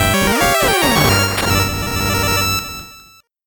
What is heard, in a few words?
8bit jingle fast